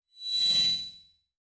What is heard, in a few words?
a satisfying futuristic s, currency